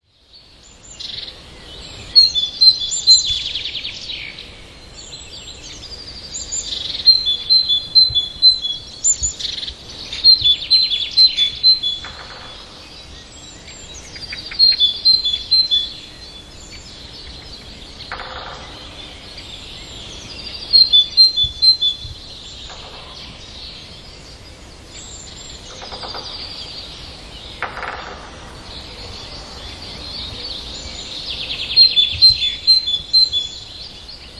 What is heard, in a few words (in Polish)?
bogatka, głos prawdziwej bogatki; wroblowe; zapozyczenia